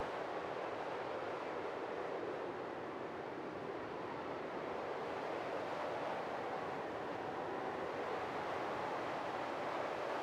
cold wind loop; background

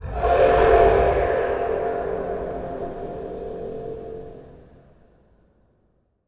Mountain Guardian Leap Up DISTANT; Journey

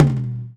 Tom Mid Delay, Drums

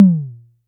Tom; Ableton Live 12 Lite; Core Library; Drums

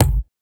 Kick Can

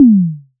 Tom MG1 Fat, Drums

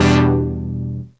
Synth 10bit Emin7

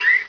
WHALE; Canon CameraWindow

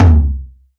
Tom, Drums; One Shots

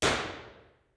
Lecture Hall, Reverb; 40x70x30ft; FL Studio 9; Fruity; Edison

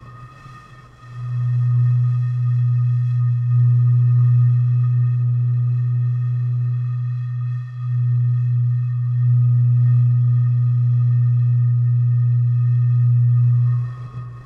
Whistle Stone Champagne B Loop; Journey

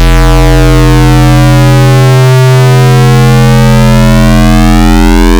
Vector Sprite Basics 5th Brutal; Ableton Live 12 Lite; Sprites

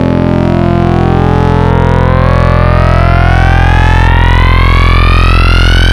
Harmonics Shrinking Saws; Ableton Live 12 Lite; Vector; Sprites

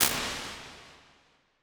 Halls Brass Hall R; Impulse Responses; Ableton Live 12 Lite

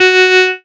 Smooth Synth F#3; Multisamples; Synths; Ableton Live 12 Lite; Core Library

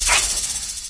snake hit; There; ThereClient

